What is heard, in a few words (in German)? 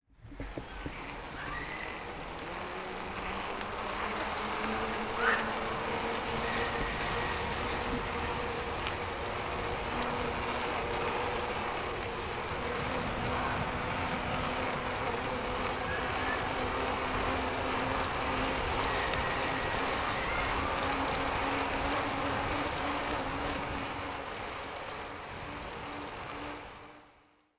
FAHRRAD